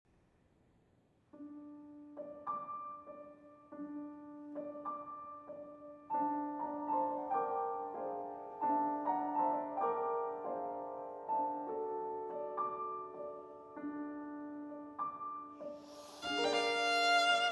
Habanera, Violin and Piano; M. 51; Arr. Catherine

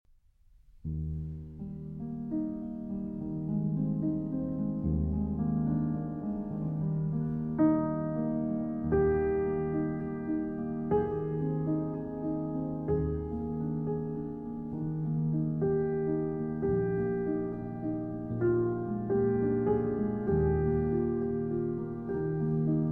Grand Piano

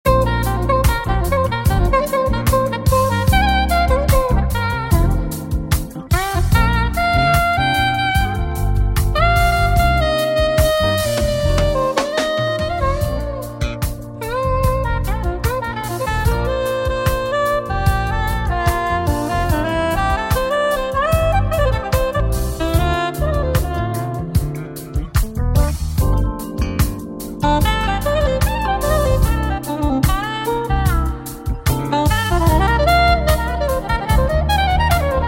Smooth Jazz Relaxation

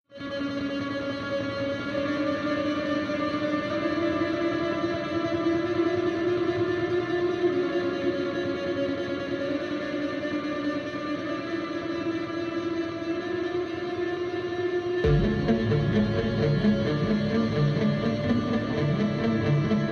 Viola

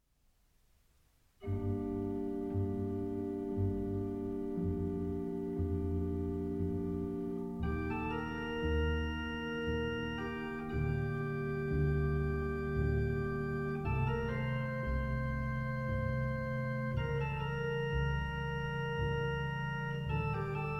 Organ and Strings; Adagio in G Minor

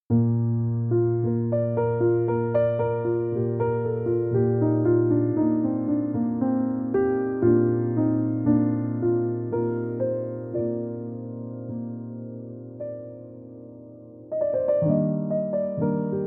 Jazz Instrumental Chill Autumn Jazz Piano

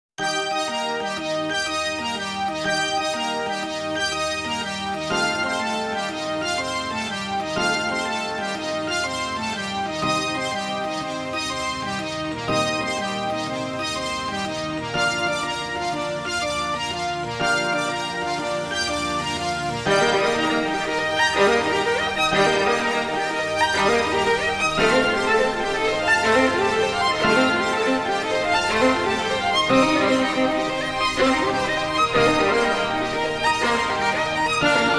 Solo Violin and Electronics

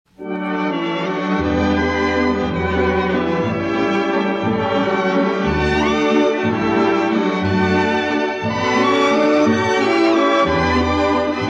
Orchestra - Eva Waltz

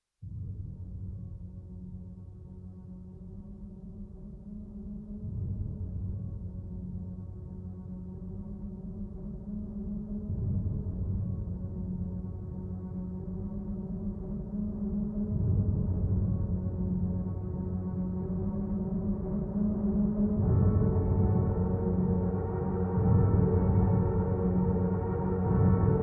Instrumental

